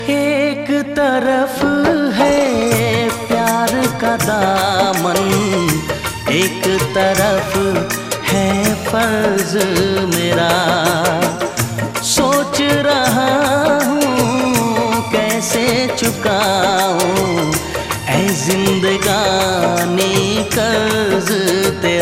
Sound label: Sad Hindi Song Ringtone